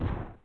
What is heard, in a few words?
Building Shrapnel; Harvest Massive Encounter